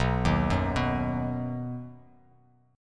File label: B Major, Bass Standard; arpeggiated; JGuitar